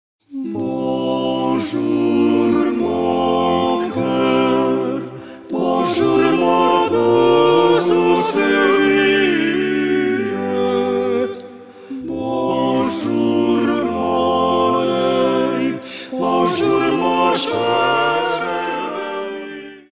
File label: Voice Quartet; Bonjour mon Coeur